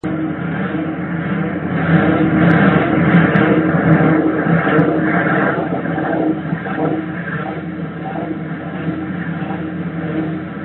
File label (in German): windrad